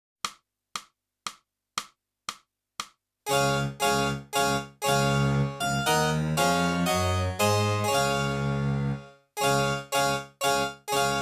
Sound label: sax, galliard